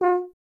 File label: BRS TUBA, Solo Instruments